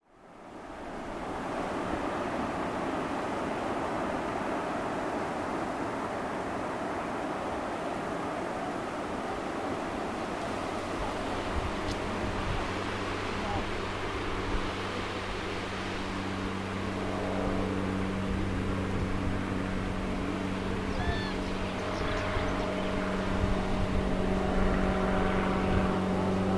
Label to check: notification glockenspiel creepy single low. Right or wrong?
Wrong